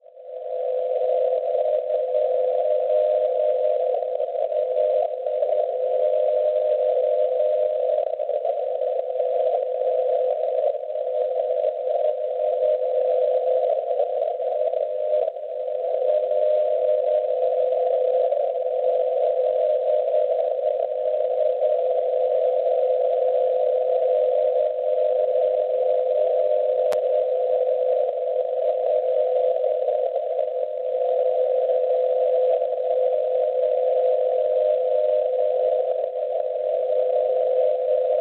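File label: NDB non-directional beacon, 342 kHz; SL; Goteborg; Svezia; Schwarzsee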